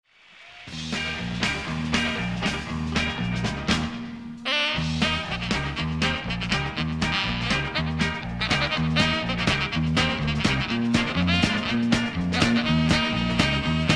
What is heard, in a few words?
Sax